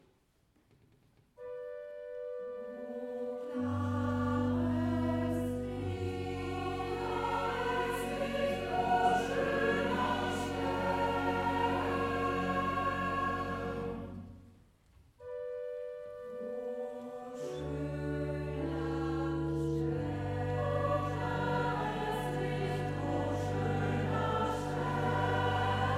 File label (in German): Chor im Advent